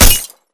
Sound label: bullet light ballistic solid glass close; Titanfall 2